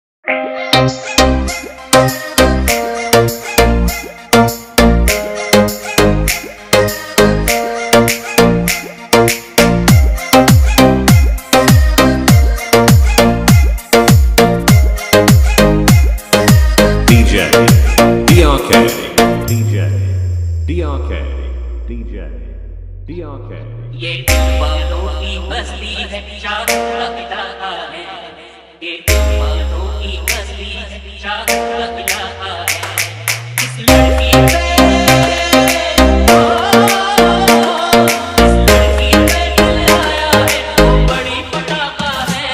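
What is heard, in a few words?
hindi song dj jbl vibration remix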